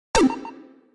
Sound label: BEEP; Galaga Wars